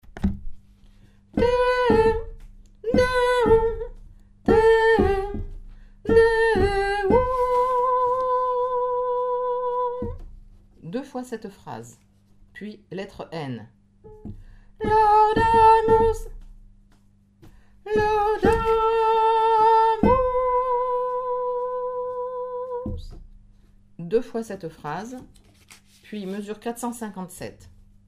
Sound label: te deum alto; chorale